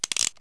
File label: gun cock; Wired for Sound Pro CD; Aristosoft, Inc.